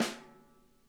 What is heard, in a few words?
SNARE; Sonic Reality; Interactive Drum Kits